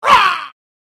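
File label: goblin sound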